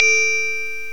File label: Glockenspiel; GMPlay; Chromatic Percussion